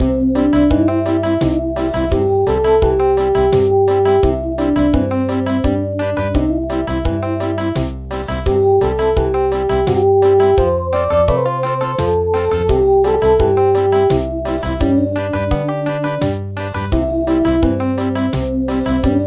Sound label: Country; Minato